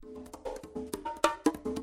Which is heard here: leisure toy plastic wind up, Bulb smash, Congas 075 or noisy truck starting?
Congas 075